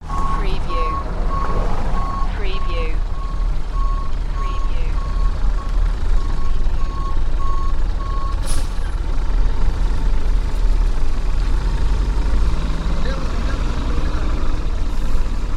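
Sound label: Dump Truck Back Up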